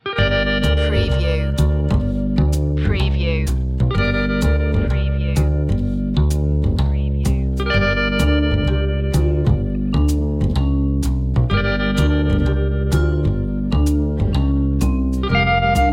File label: retro spy no horns